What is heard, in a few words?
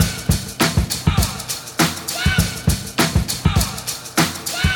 Live Funk Drums